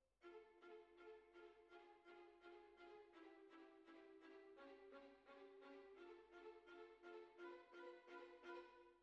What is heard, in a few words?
Cellos